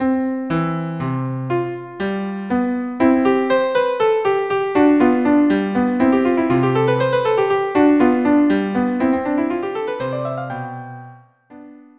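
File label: viola cello duo minuet; KV 487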